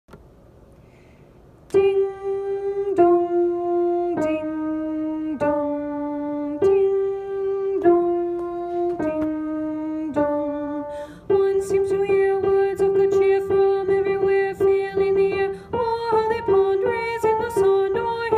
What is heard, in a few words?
alto part